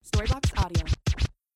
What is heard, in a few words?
dj scratch 4 times